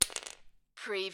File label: Lego, single lego piece drop on floor